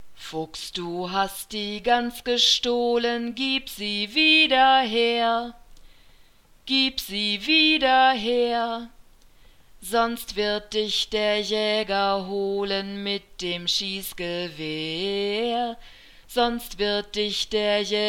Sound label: nursery rhymes fuchs du hast, German